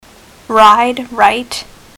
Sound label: ride right